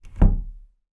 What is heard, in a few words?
household door cupboard under stairs close